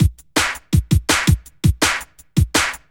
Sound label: Trippin Break; Jungle; Breaks; Blu Mar Ten - Jungle Jungle - 1989 to 1999 Samplepack